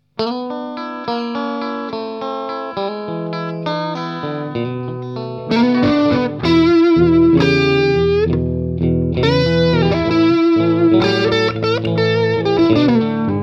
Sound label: rock mix, guitare